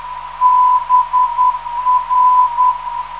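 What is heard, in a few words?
non-directional beacon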